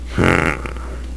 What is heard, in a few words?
NOISE